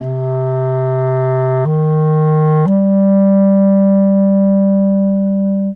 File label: keys, Loops, Sad